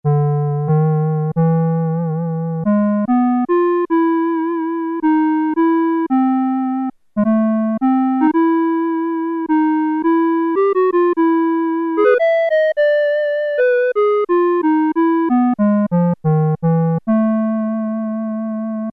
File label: Oboe; Factory Presets Bank B